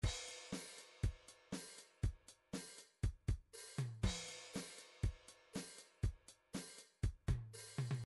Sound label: drums drive 150